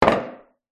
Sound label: pub wooden bar stool set down on tile floor; field reports